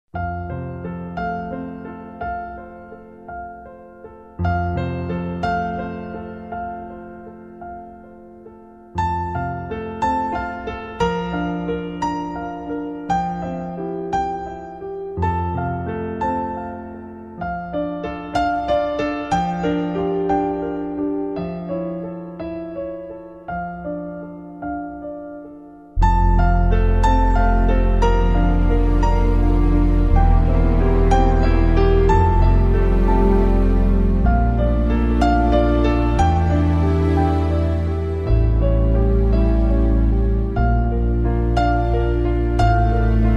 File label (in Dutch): Piano receptie LIVE